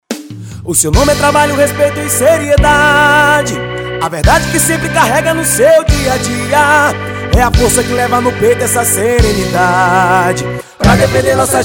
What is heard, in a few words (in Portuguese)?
jingle eleicoes prefeito axe